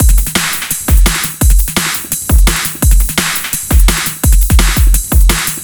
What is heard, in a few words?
Arena Kit A, drum bass; сэмплы ударных